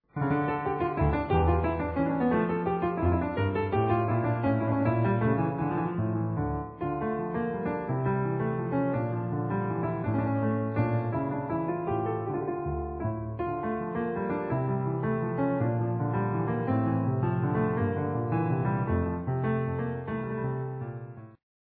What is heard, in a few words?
Courante